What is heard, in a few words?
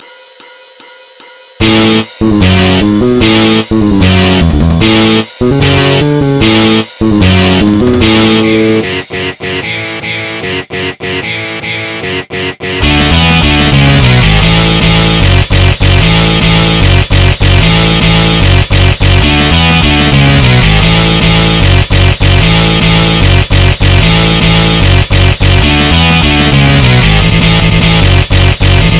Black Metal